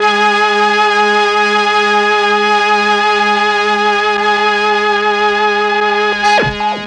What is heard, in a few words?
MEL STR, Legendary M400; ILIO; Propeller Island